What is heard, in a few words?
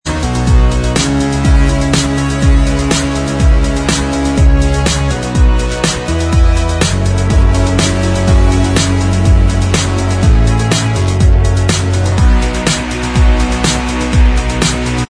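instrumental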